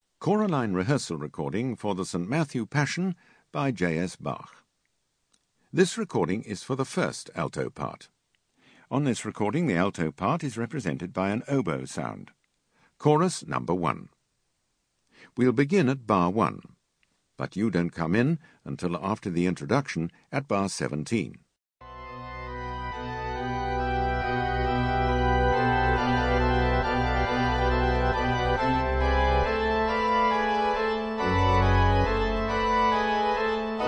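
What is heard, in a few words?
Alt1, alto 1; Bach Matt Pass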